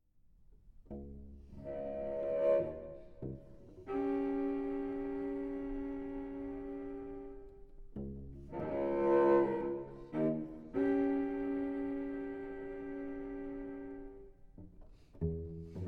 La Follia Pour Violoncelle Solo; Eschaich

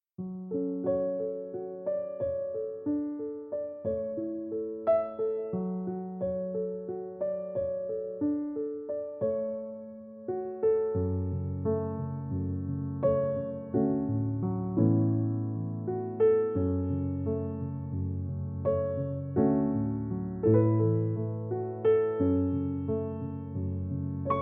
Piano Version

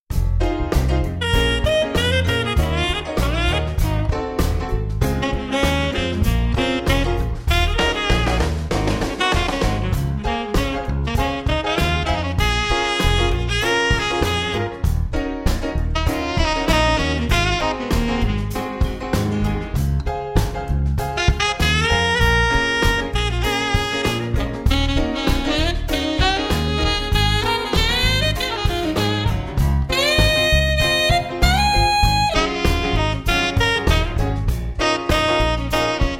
Funk! Easy Listening Jazz

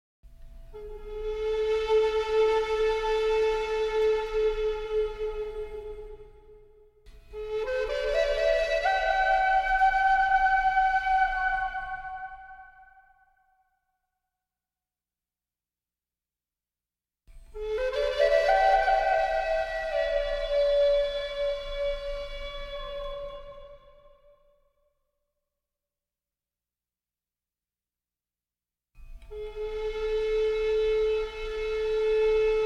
Two Flutes

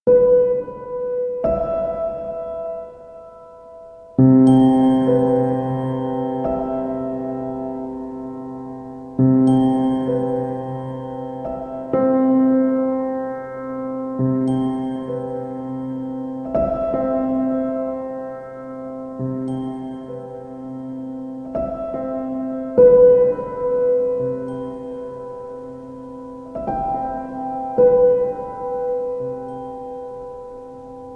Piano Sounds

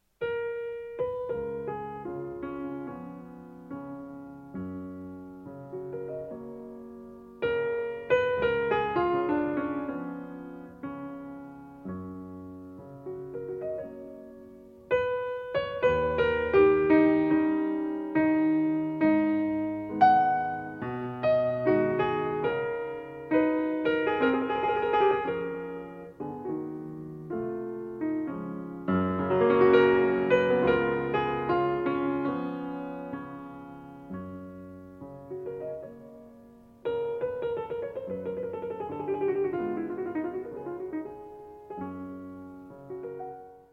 Nocturnes, Larghetto in F-Sharp Major

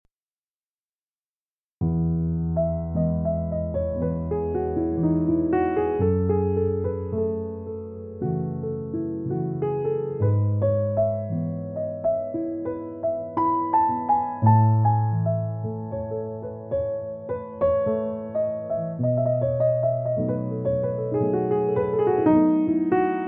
Chilled Jazz

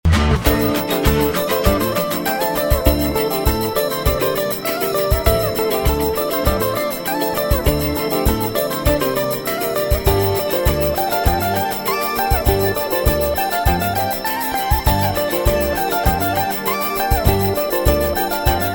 Jazz

